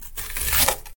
cut onion, cooking; 野菜を切る３

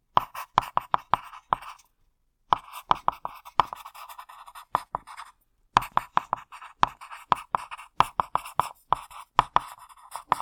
write with pen, ペンで紙に書く

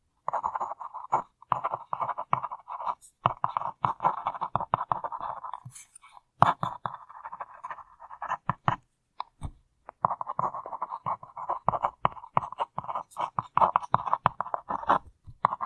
write with pencil, 鉛筆で書く

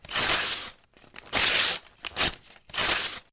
tear paper, 紙を破る; 紙を破る２

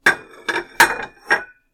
clear dishes; 食器を片付ける４

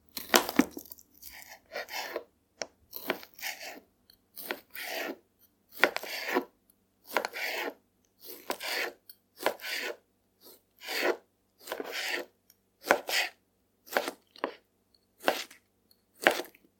cut potato slowly, ジャガイモ緩切り

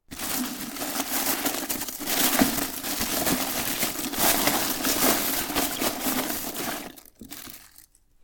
search inside trash, ゴミ箱の中を探す

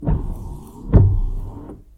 windshield wipers, car; ワイパー１; 自動車機器

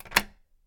turn balcony key, ベランダの鍵回す

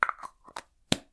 spray can cap, スプレー缶の蓋付

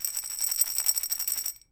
shake pill, 錠剤を振る; 錠剤を振る５

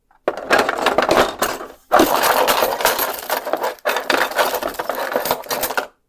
search in drawer, 引き出し中探す; 引き出し中探す２

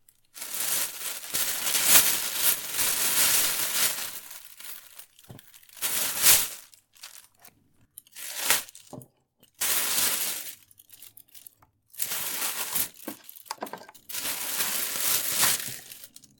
in plastic bag, ビニールに物入れる

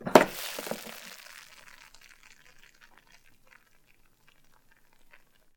open rice cooker, 炊立て炊飯器開

